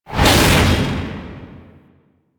Heavy Hammer Whoosh; Warframe; Duviri; Dax; weapons

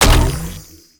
Mushroom Bounce Impact; Warframe; Nokko

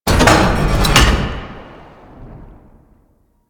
Vial Hazard Restock Sphere; Entrati; Hazards

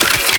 Mech End; Warframe; Weapons; Archwing; Arch Anti Aircraft Gun; Kuva Grattler

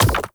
Hand Bomber Proj Bounce; Warframe; Man In The Wall

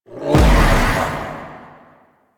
Execute Heavy Strike; Warframe; Warframes; Pax Duviricus

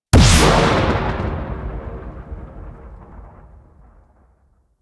Bubble Explosion; Enemies; Abilities; Warframe; Teralyst; Rainalyst

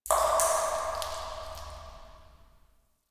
Interior Cave Drips, Ambience; Warframe; Duviri

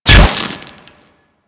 Bolt Impact Stone; Warframe; Weapons; Cross Bolt

